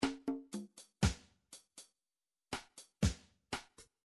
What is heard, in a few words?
drums reggae, midi drums